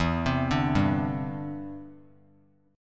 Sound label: E Minor 7th Sharp 5th; arpeggiated